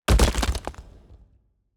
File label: heart damage stone